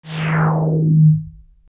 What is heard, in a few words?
Random Sound; Europa